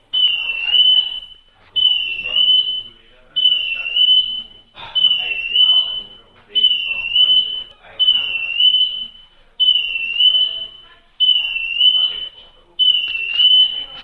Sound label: alarm